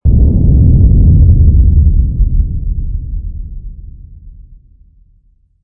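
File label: explosion distant; amb elements; modern warfare 2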